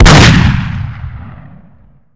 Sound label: space shoot turret; SpritesRTS